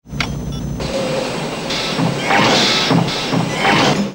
chain guns; ADF pod

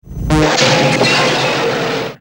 Turbos engaged; Airwolf

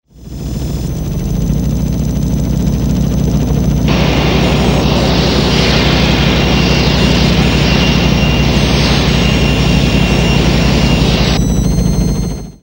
Internal Hum; Airwolf

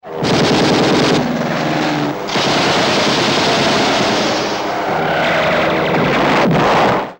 Corsair Gunfire Explosion; Airwolf

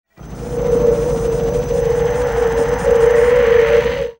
Ghost Sound; Moffetts

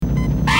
helmet visor down; Airwolf

